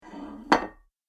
Large Dinner Plate Slide Over Table Then Placed; Household; Dishware; Andrew Fleming

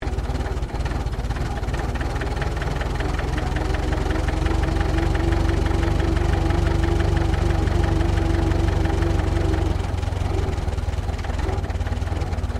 Truck Chevrolet 1926 30cwt Start Stop; Classic comercial vehicles; Trucks

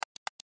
Mouse Click Double Hard; Clicks; Multimedia; Technology; Blastwave

